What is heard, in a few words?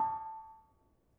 Bonang Panerus soft, gamelan